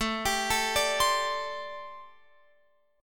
A Minor 11th A Guitar Open F; x,16,19,16,17,19; arpeggiated; Am11

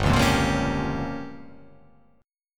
G Minor 7th Flat 5th

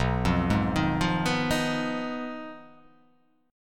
B 6th Flat 5th B down, chord

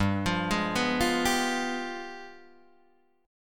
G 7th Flat 9th, Guitar, Open D

